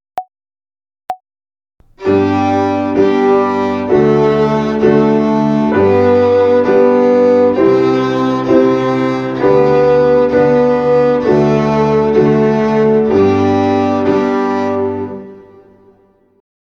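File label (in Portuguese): Ginástica até o dedo 3 - Corda SOL - Binária, Violino; Violino para os Pequeninos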